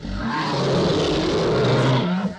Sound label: damage; tengu